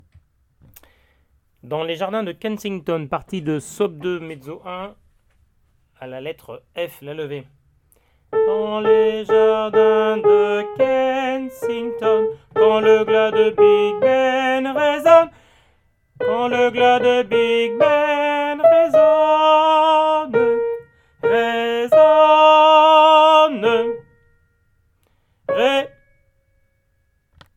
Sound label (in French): Dans les Jardins Sop